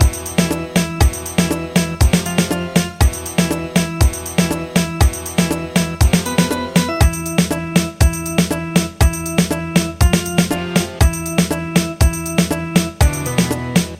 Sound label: Htc Ring; Htc Mission